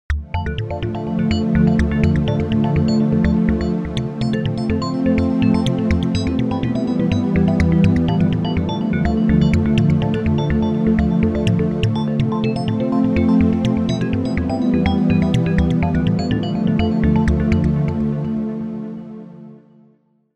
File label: Layer Pad arp